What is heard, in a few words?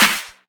Claps & Snares